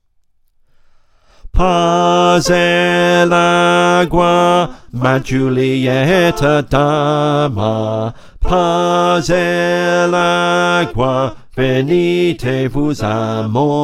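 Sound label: Bass, choir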